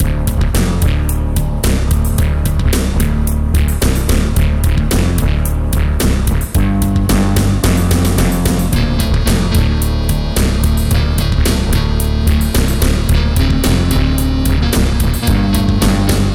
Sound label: Bass Synthesiser